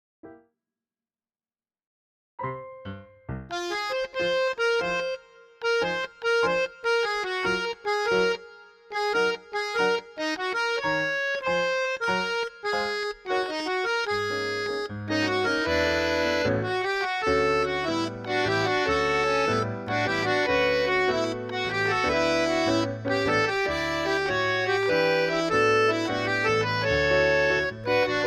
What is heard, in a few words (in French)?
PNO VOIX